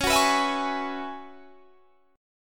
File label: F sharp 7th Suspended 2nd Suspended 4th, C sharp